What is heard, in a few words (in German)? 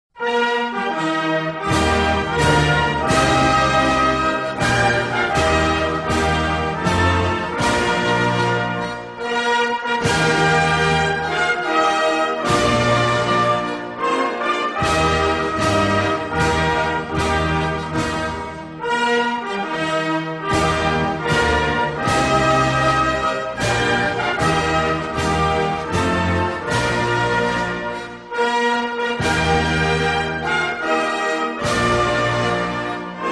hymne us